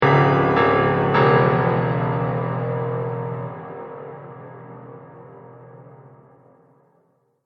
Horror Dark Piano, Piano Sound Effects; musical

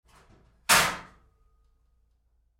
Door Locker Open Roomy Med, Metal Door; Doors; Door,Locker,PK1,Open,Roomy,Med

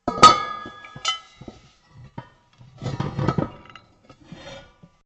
METAL ON METAL LARGE IMPACT; Metal (Sound Effects:Materials)

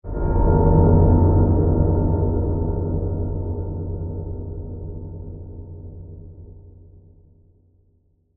Hit Reverb Dark; Hit Sound Effects; Sound Design

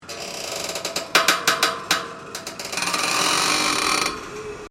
Cables Creak, High tension cables in strong wind; Metal; Materials

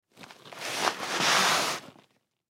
Briefcase Push, Briefcase Push Away; Slide Sound Effects; Foley; Objects; Blastwave

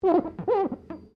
GLASS SQUEAK FINGER

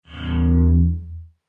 Space Metal Sound, science fiction sound effects

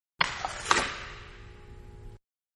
Ice Hockey Passing puck; Hockey (Sound Effects:Sports); Manhattan Production Music

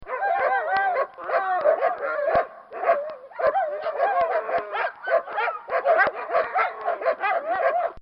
Pack Of Dogs; Animals; Bobby Cole

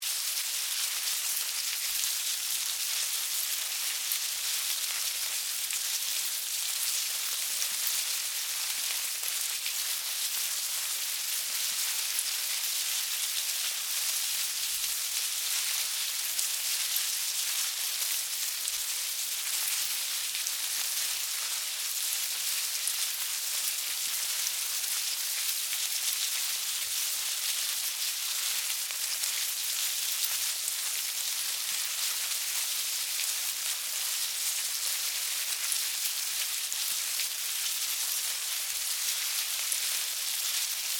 Hive Crawl Bugs, Animal, Movement, Insects; BlastWave

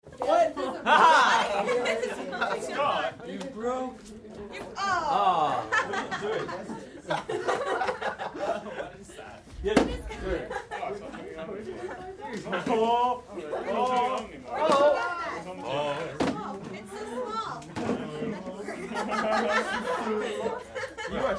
Crowd Teens Party Chatter Reactions; Crowds

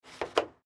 Door Lock Porta John Interior Perspective; Metal Door Sound Effects; Foley

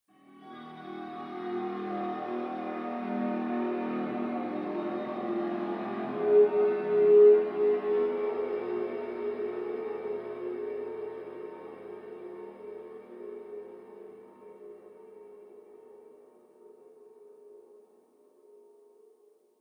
Horror Tension Transition

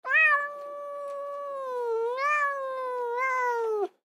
Cat Meow, Cat Long Yowl; Animal; Cats; Blastwave

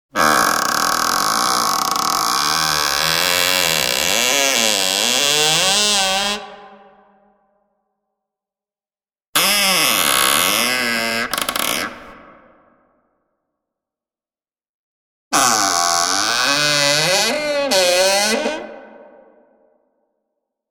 Creepy wooden door creaks with reverb; Creaky Doors

